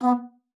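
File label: B3, bass flute; Xsample VOL-2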